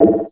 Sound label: hud on